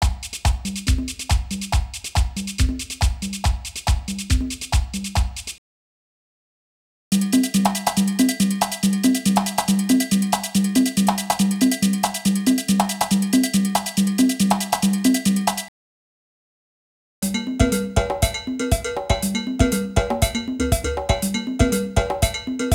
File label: Salsa Picante; Masterbits; World Zone VOL-3